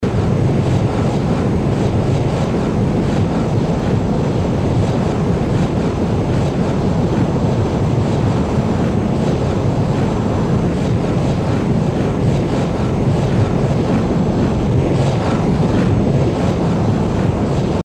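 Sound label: jet interior noise phasing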